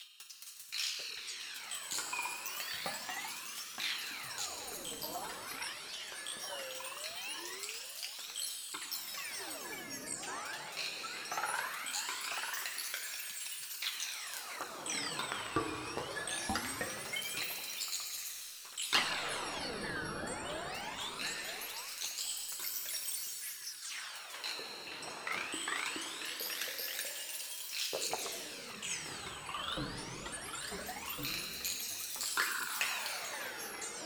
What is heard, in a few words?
Shaker Cycle